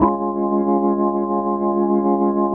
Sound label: ORGN 123, Keys; Houseworx